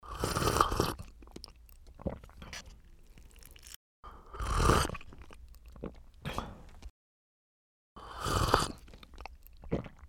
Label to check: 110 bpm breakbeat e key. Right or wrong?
Wrong